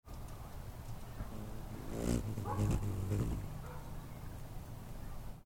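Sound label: Rufous Hummingbird Short Flight To Feeder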